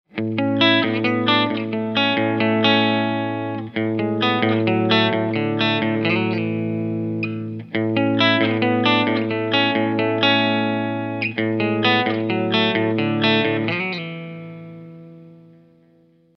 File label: clean split, humbuckers; bounty hunter c; pickups